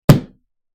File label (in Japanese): 内側から壁を叩く音